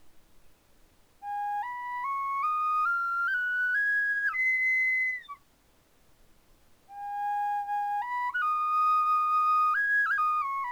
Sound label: high G sharp, flutes